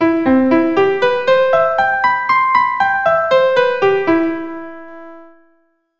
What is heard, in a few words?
C M7 Arp RH on E, keyboard